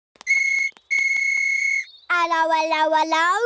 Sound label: ring